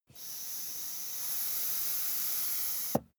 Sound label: air pump ball